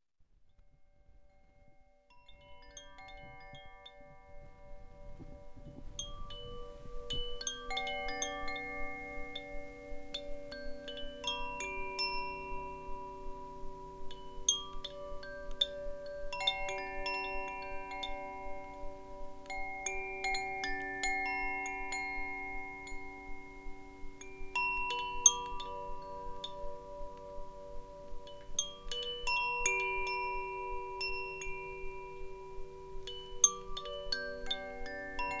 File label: Koshi Fire